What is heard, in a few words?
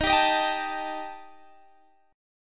D sharp Major Flat 5th, Guitar, Drop D; x,18,17,20,20,17; chordshape